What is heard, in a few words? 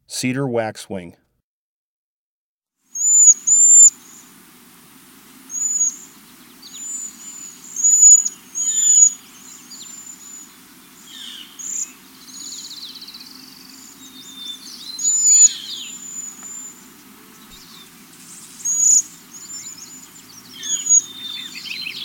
Cedar Waxwing, Birds of the Chicago Region